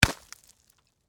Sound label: Impact Ice Block, Hammer; Wet; Miscellaneous Impact Sound Effects